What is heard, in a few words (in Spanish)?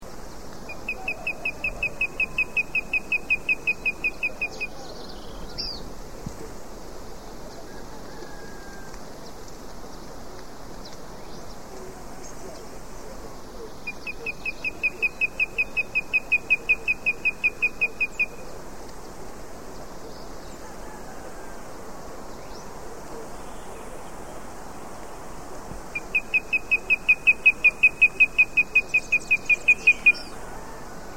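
galli collar, Melanopareia maximiliani, Olive-crowned Crescentchest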